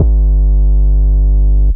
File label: 808's